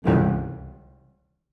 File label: Low Note Scary Stab; soundscrate